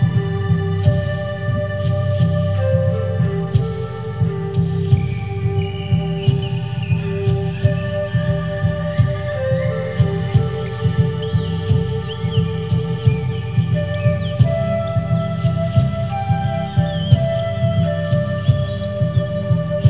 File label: song; vchat; Kiva